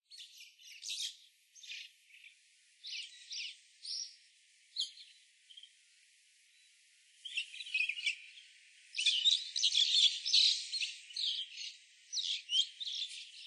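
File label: Red-billed Starling; cheju; koe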